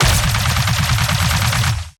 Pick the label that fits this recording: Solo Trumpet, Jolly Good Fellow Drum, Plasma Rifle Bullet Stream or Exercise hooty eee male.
Plasma Rifle Bullet Stream